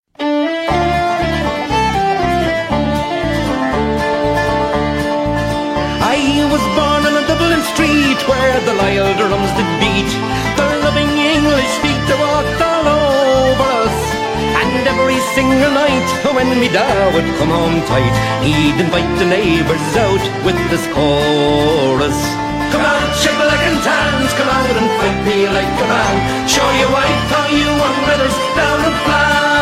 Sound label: irish Country Music Ringtones